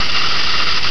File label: silly string